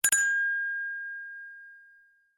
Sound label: Huawei Nova Y60 Sms